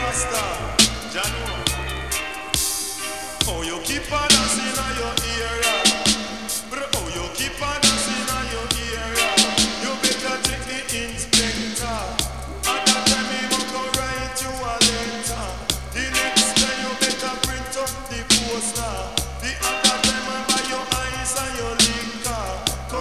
YELL

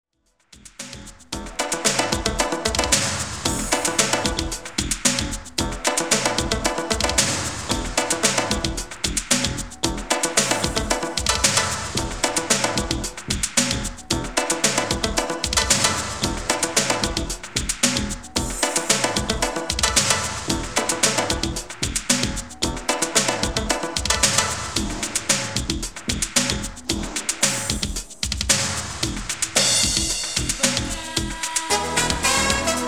SWING

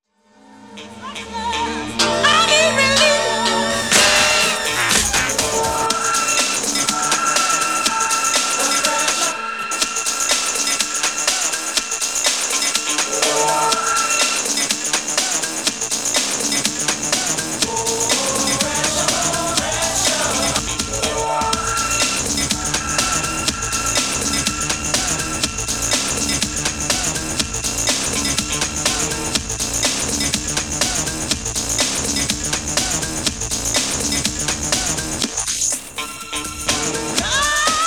WALTZ

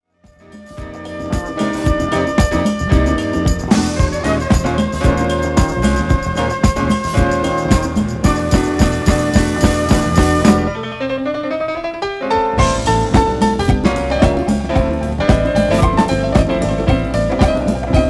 groove

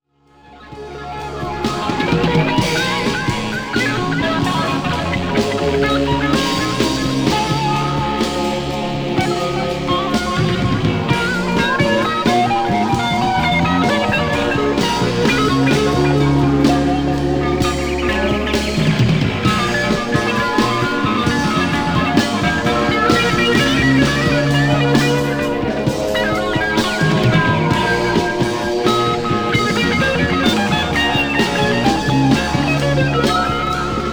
GONG

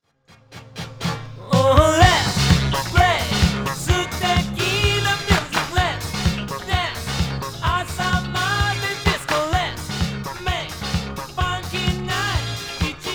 funky

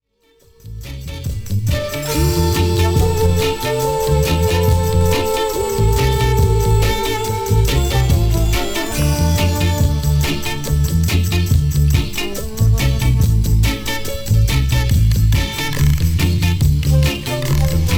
flute

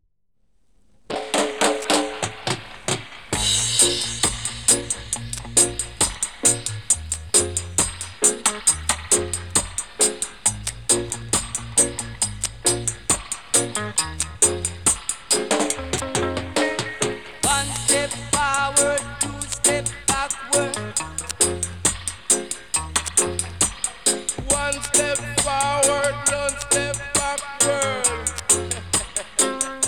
JAZZ

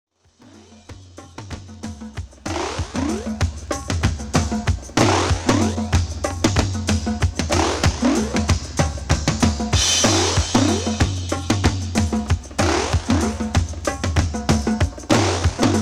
DRUM

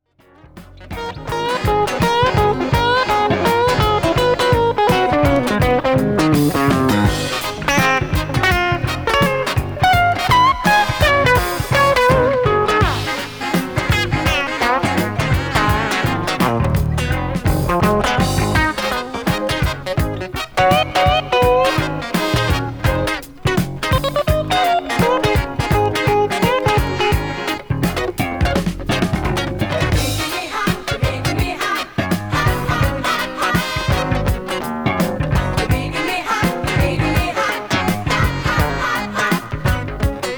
FUNK

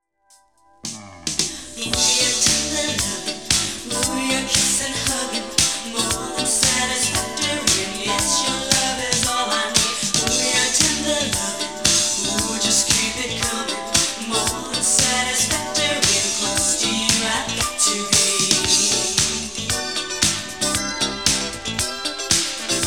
FUNK

